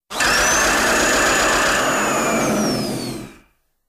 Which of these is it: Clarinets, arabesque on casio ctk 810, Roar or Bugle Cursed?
Roar